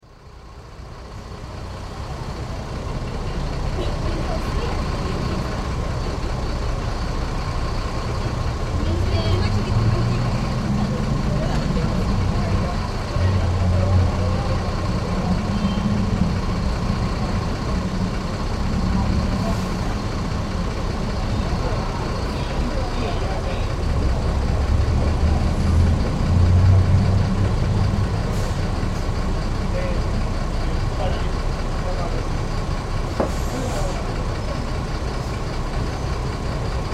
plazas mini terminal; sarmiento

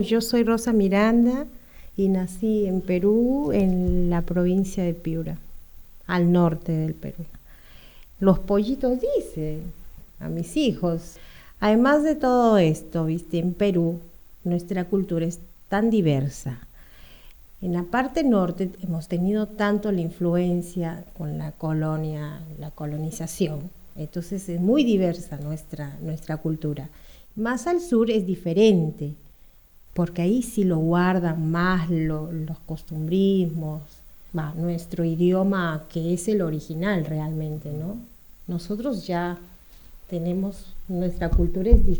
canciones de cuna

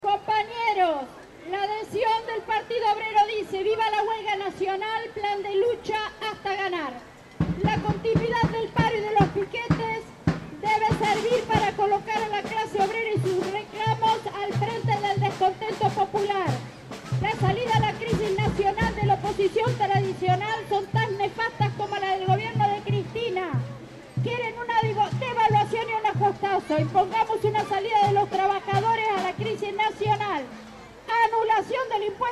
actos paro plaza san martin; paro 20n; Rosario

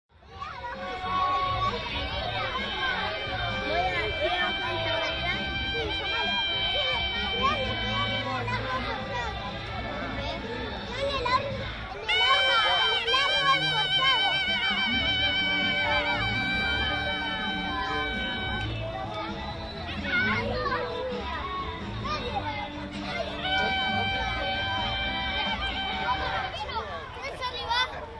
parques ninas y ninos jugando arriba tronco; s ortiz

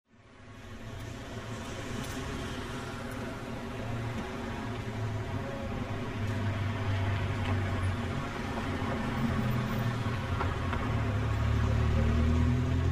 calles sirena patrullero; warnes y superi; Rosario

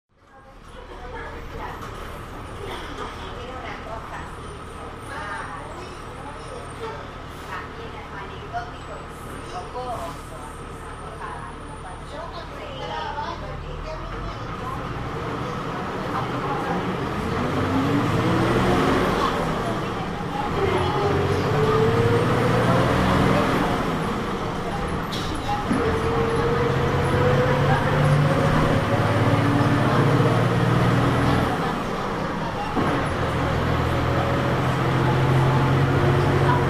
transportes linea de la costa

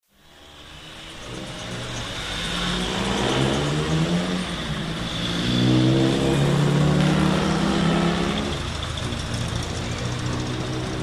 calles rosario; av pte peron y av provincias unidas 15 hs jun 2003

